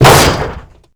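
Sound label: debris metal medium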